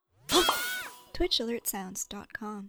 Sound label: Anime Follower